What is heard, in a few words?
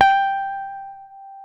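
jazz guitar; Univers Sons; Jazzistic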